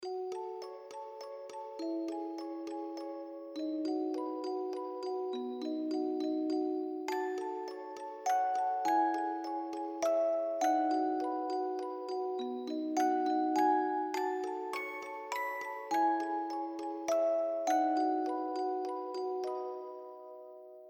music box theme; crime; childs game

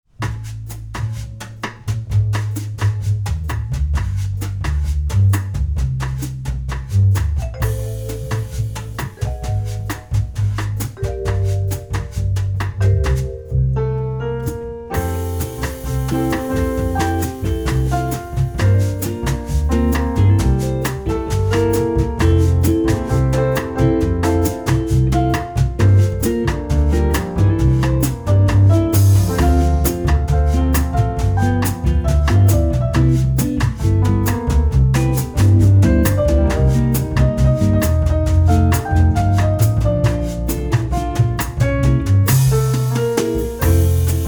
Solo Bossa Nova

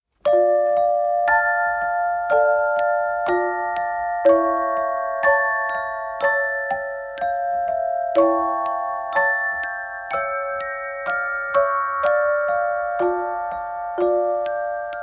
Dracula Music Box

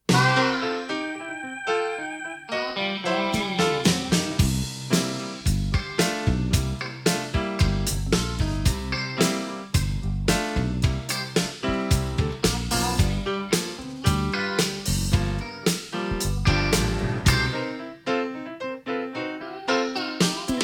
Blues

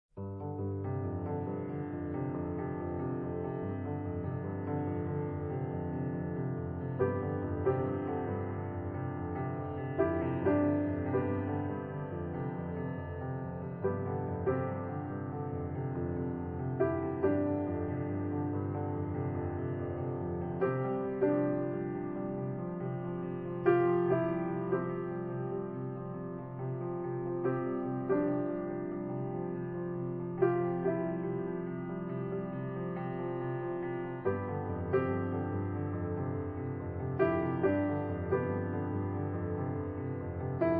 Movement II

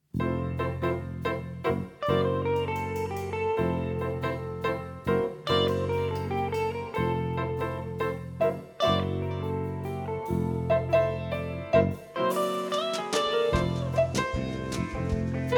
Bossa

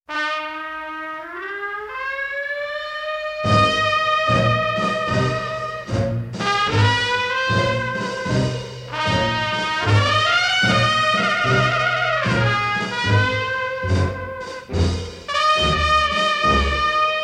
Blues, Jazz

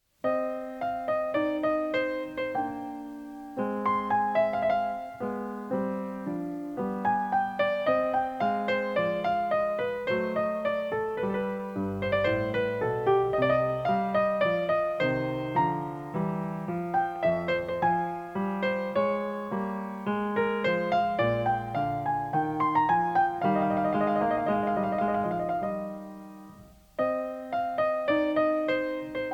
Classical Piano

